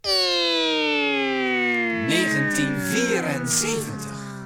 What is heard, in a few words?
jinglepackage; topformat LP jinglepackage 1